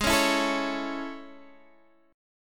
A Major G sharp, Chord

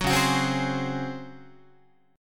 Db Major 7th Suspended 4th, Guitar; strummed

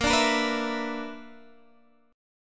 B-Minor Major 11th

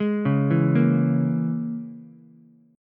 Bb Minor 7th Flat 5th, Ukulele; Bbm7b5; arpeggiated; 1,1,0,1; JGuitar

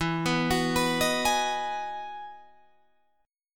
E Major 9th E down Guitar Drop D

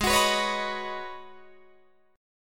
Ab Minor 11th, Ab, Guitar, DADGAD

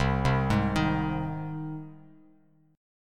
C Minor Sharp 5th C down Bass Standard; arpeggiated; JGuitar

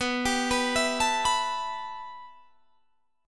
B 7th Suspended 4th Sharp 5th, Guitar, Standard; B7sus4#5; arpeggiated; JGuitar

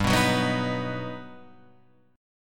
G Augmented Major 7th, Guitar, Open F; G+M7 strummed; JGuitar

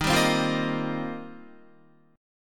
D sharp 6th Add 9th, Guitar; strummed; D#6add9; 10,10,12,10,10,11; JGuitar

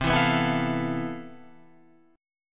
C Major 13th C down Guitar Open F; CM13 strummed; JGuitar

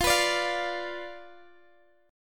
B Diminished F, guitar

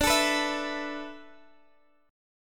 A Suspended 2nd D

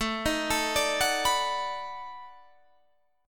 B 7th Sharp 9th, guitar

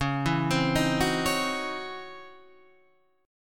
Db Minor Major 11th Db Guitar Standard; DbmM11 arpeggiated; JGuitar

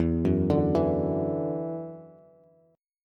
E 7th Suspended 2nd, down, Bass; E7sus2; arpeggiated

